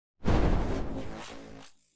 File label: spell shock take; player